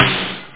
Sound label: glass snare